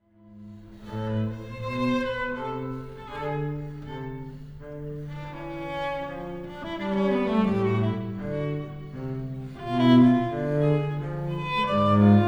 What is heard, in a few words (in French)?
Bach Sonate; Bach Sonate Entier MONO; agreg externe musique 2021